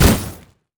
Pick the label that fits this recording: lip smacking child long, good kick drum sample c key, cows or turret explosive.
turret explosive